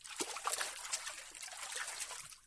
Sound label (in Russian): legkaya prilivnaya volna